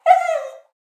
Dog whining and whimpering

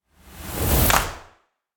Cinematic rise and clap

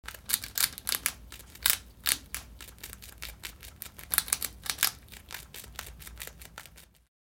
Rat nibbling on cheese

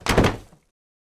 Body slam against surface

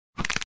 Muffled bone break deep

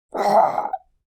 Alien creature pain medium

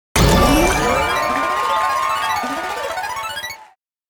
Casino slot machine jackpot big win

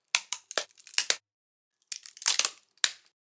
Cracking wood

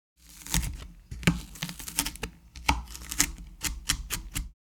Cutting vegetables with knife chopping board

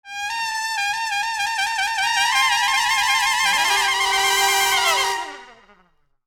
Cartoon suspense brass rising tension; cartoon ascend

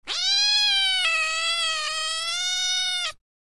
Angry cat meowing

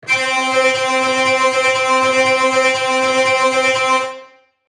Hurdy gurdy reps long

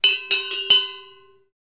Cattle wearing bells moving around

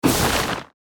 Horror survival game menu ui clear

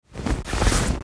Winter jacket taking off; clothing

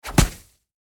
Snowball impact hitting a body

